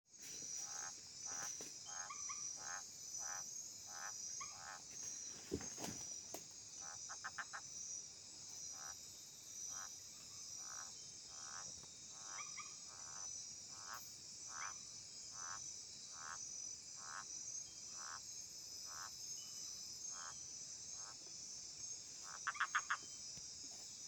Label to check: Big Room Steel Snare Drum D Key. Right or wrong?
Wrong